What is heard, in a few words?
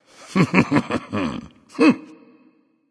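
"heh heh", nonverbal; smite; voicepack; khepri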